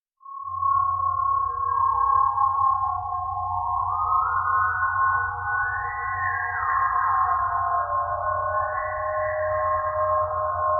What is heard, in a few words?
lab strange; TOS; mudd